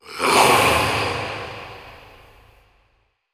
Processed Battle Shouts, Viking Vocal

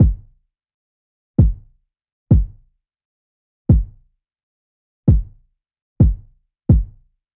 Pop Kit 130bpm Kick; EDM Drums; Drum Kits; Stems; Ghosthack; Drum Mega Bundle; Ultimate EDM Drums; Pop Kit 11

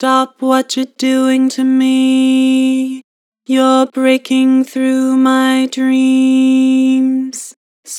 Flat, Harmor Vocals; Stop what your doing to me

